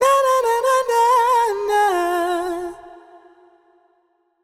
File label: Euphoria Ad Lib Wet - 140 BPM F Min; Cymatics; Vocal Sample Pack; Ad Libs